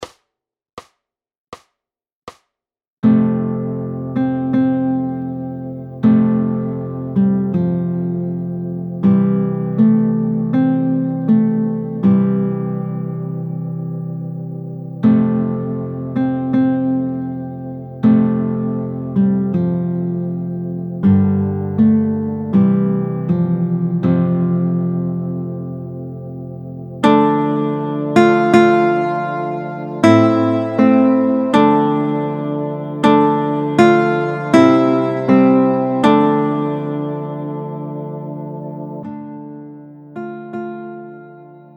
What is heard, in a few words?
largo 80